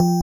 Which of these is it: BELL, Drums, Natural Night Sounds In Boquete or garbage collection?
BELL